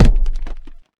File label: large rock impact